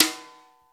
BRUSHES, 12-Bit Drums

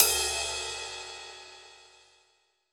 Pop Ride, Cymbals; Roland BK-5

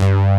FAT SYN, JV 1080; Pro-Rec - Synthsonic

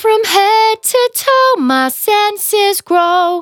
Finally 70bpm C, Velvet Vocal; Zero-G

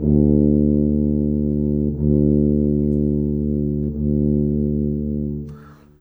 Brass, Orchestral, Rock Pop

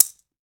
mexican, Salsa Kit; E-MU Producer Series Vol. 7 – Old World Instruments

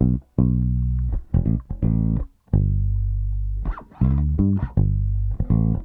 Soul Bass, 70s Analog Funk; Sonic Foundry (Sony Creative Software); What it Is!

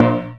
A#, Synclav FM PERCUSSION; Big Fish Audio

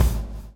BLOW; Spectrasonics - Backbeat

